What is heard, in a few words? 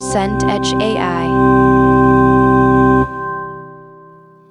organ pedal low